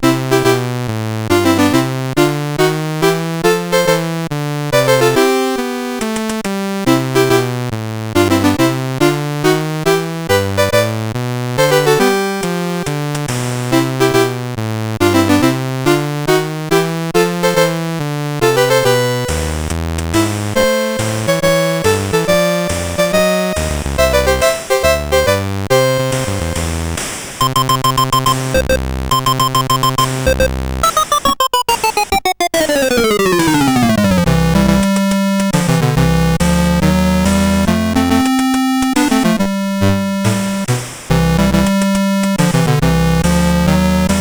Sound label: Fireworks Celebration, CSG8580R5